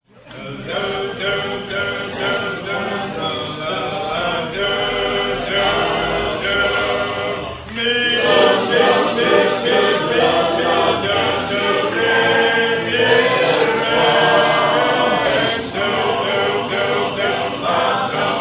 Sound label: harp